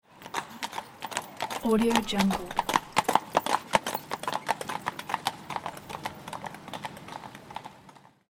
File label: horse and carriage passing by; alexander blu